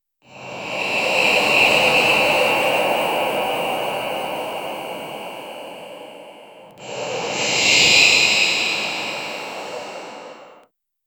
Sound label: design an unsettling soun, darkness